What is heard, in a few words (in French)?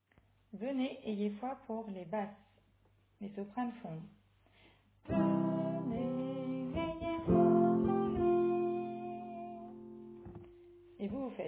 Basse